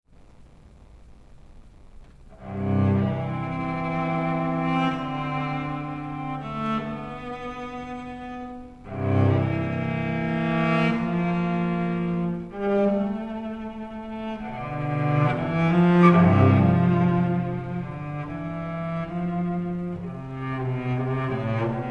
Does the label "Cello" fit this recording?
Yes